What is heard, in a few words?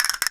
CASTANET; ILIO; Synclavier Percussion 2; World & Orchestral